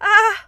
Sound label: fear